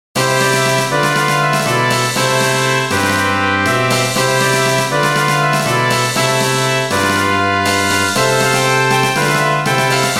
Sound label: fanfare